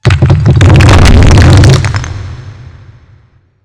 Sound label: stone fall